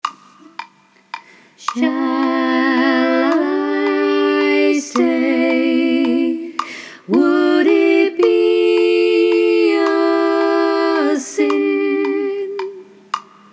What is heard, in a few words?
harmony, ukulele